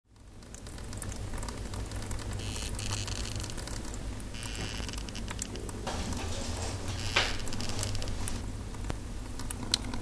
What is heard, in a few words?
D tinctorius, chants